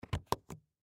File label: Toy Chest Latch Open; Miscellaneous Cartoon Sound Effects; Blastwave; Foley